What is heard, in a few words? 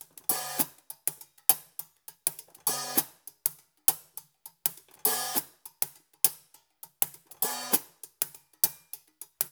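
mini hi-hat; Spectrasonics; Liquid Grooves 1 & 2; BR.SHUFF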